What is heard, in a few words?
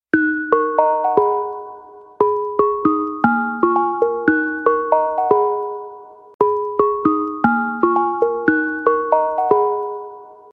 Dreaming Tone, samsung; zange samsung makhsose hamye goshihaye samsung